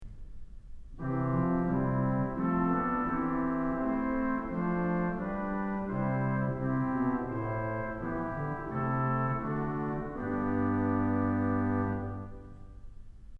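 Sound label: Great Ophicleide; Kellogg Auditorium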